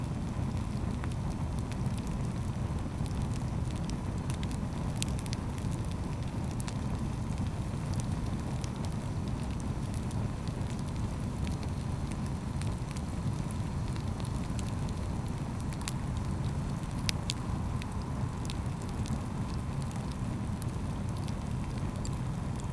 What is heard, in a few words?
Bonfire Loop